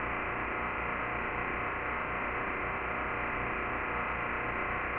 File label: Motor Bearing Sound